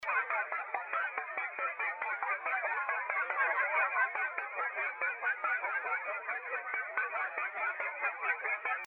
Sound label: electric gamelan SHORT WAVE MOD VOICES